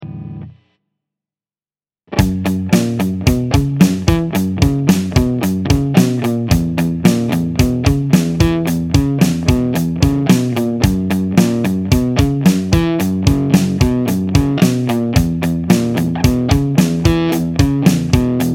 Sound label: Baseline Riff